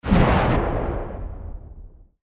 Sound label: fireball; Cartoon Network - Toon Cup 2020